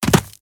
Jump Down; Idle Champions of the Forgotten Realms

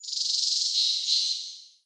ambience sanctuary birds

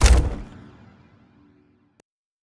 Object Place Wood; LevelEditor

